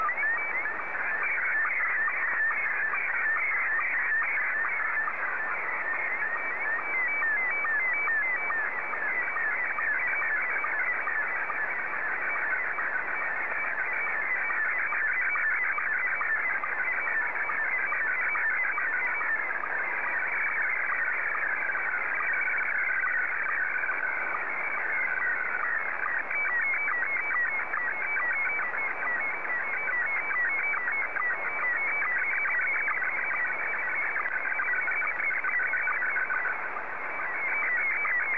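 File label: SSTV, Scottie 2; JA3QJQ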